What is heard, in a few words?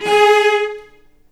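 G#3 arco long cello, right; East West; The Ultimate String Collection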